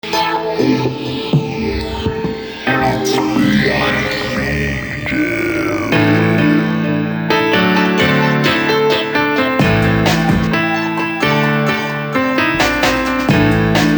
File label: banana jazz